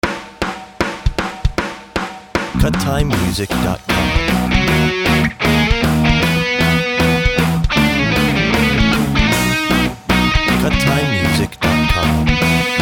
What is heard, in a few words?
Rock Love Punk